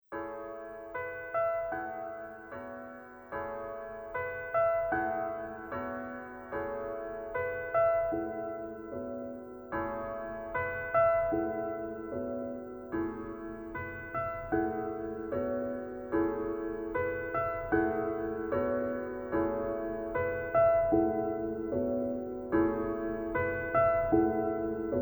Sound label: Melodic listening